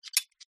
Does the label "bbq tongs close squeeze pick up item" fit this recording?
Yes